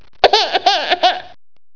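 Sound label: BABY CRY; The Ultimate Guide to Mortal Kombat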